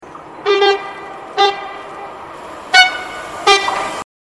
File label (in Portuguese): Buzina; Comboios